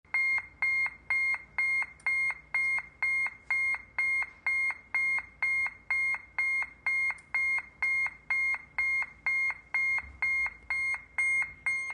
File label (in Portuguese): sirene alta potencia